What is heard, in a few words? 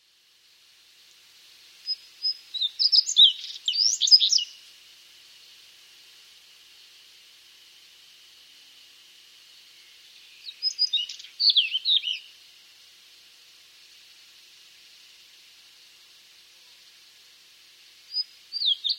jobitaki; ジョウビタキ